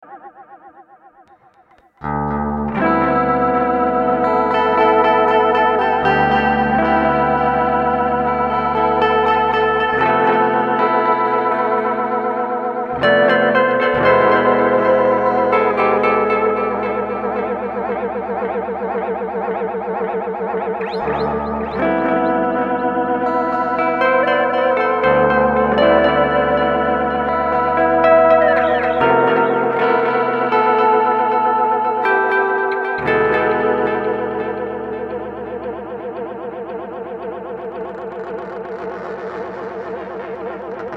Enzo Delay

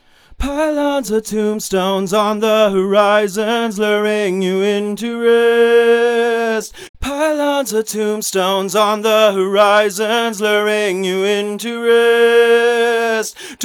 VOX V76 Pre subtle cutdrive

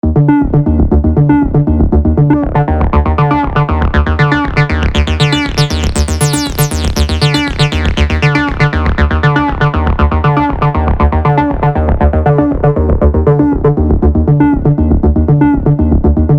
Avenger AR Like Bass Arp Step SQ Pitch

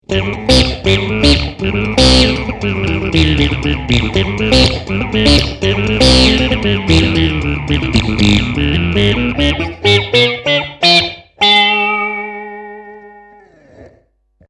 Octaver

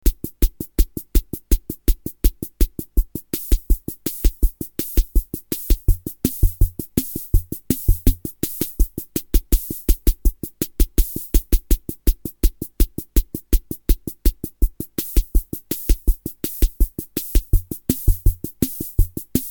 rhythm80 var beat

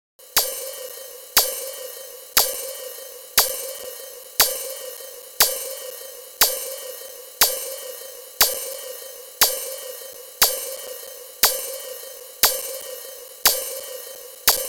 Shift Register

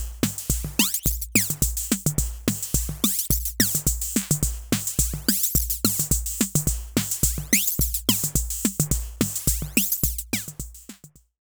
Sequence SD und HH Dec Mod HH Tune Mod

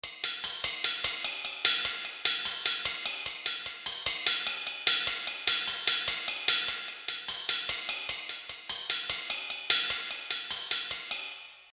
Kawai XD 5 Cymbal Single

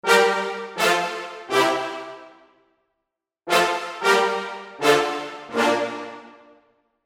Big Stab; Nexus 3; KSHMR Expansion

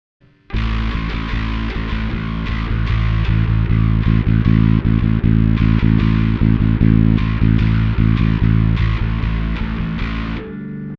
Darkglass Microtubes X7 Lowpass

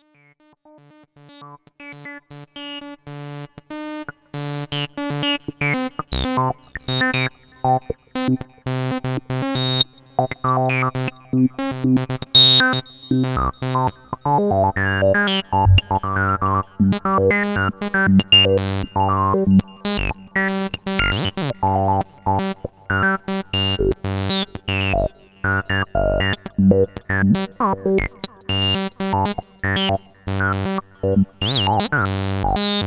obx voice solo sequ

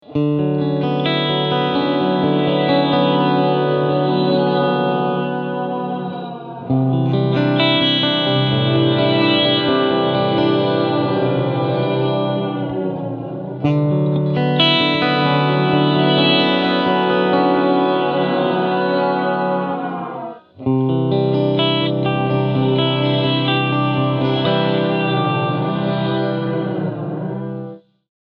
EHX Dirt Road Special Reverse Hall; Tone 12 Bite full

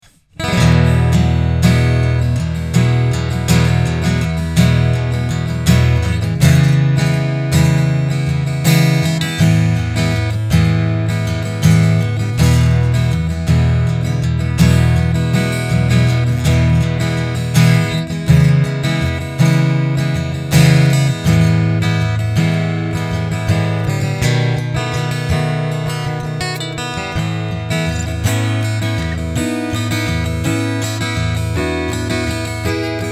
Strumming EQ Full

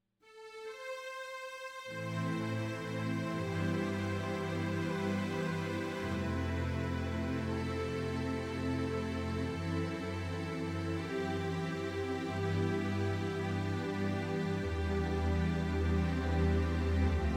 Triton Taktile Legato Strings

